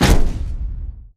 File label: footstep generic close; Titanfall 2